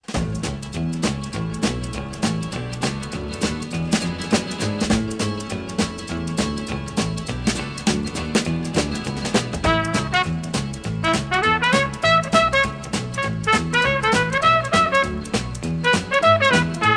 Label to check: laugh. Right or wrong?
Wrong